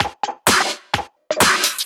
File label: Housebeats, 128BPM; Vengeance Samples; VEH2 Loops